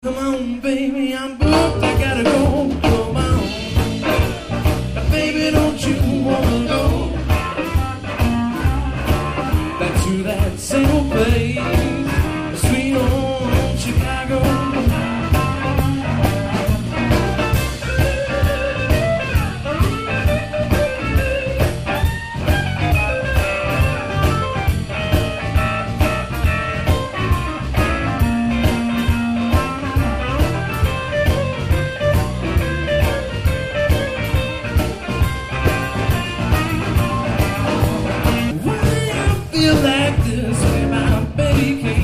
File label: band recorded last night at bbq blues